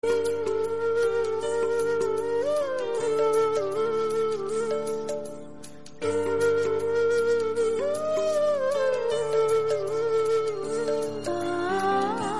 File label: sad, ringtone